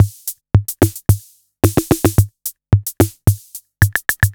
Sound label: Analog 110 bpm, retro drum machine samples, Loops; MT40